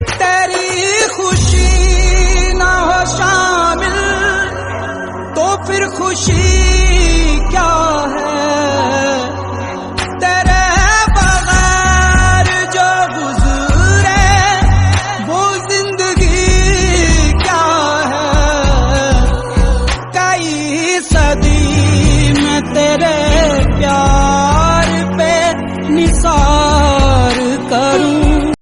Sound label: song